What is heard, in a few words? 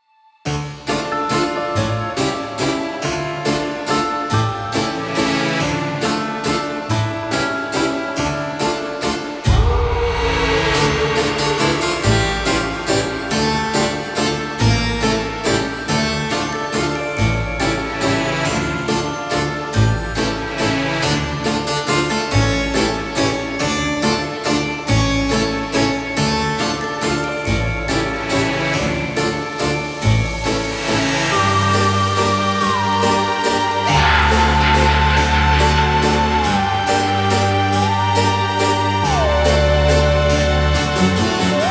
creepy season halloween; bansonic